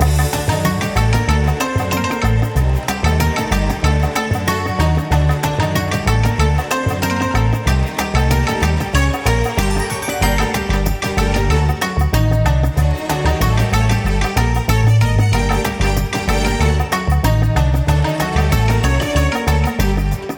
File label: freespin melody; Book Of Pharao